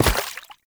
Step Water; Enemies; Foley; Footsteps; Warframe; Lasrian Soldiers; Lasrian Butcher

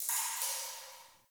Cave Ocean Drip; Ambience; Midrath Island

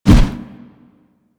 Heavy Melee Swipe A; Warframe; Weapons

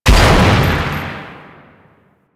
Shotgun Shot; Warframe; Veilbreaker; Kahl

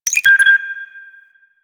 Move Notification; Warframe; Colonist Rescue

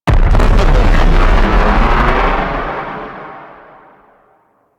Beam Explosion; Missions; Raid; Grn Settlement Vayhek Fight; Fomorian

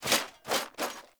Foley Sword Taunt; Raider; Sinecure; Enemy

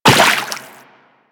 Run Water, Footsteps; Warframe; Teshin

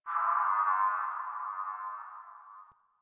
Landscape Distant Robot Call Low, Ambience; Warframe; Venus Open World; One Offs

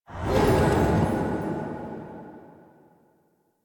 Go Board Quit; Duviri; Komi

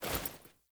Foley Action Default Punch, Default Clothing; Characters; Player

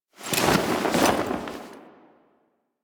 Camp Canopy Flap

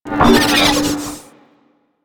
Moa Investigate, Vocals; Warframe

